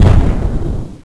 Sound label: explo Std; chromium-bsu